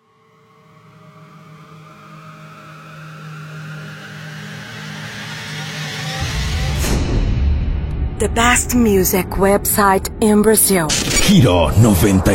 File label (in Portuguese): Batida do ElectroFunk